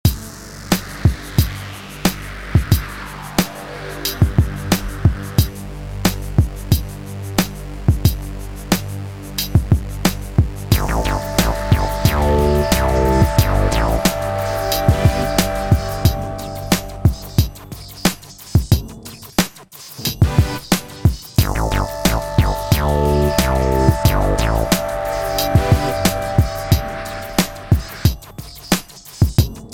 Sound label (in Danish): hovedtema